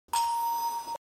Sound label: quiz ding